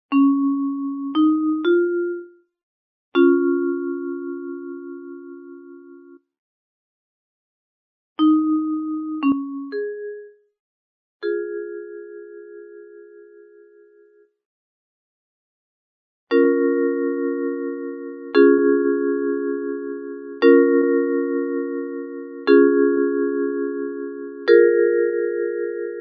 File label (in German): nachbildung glockenspiel; grengiols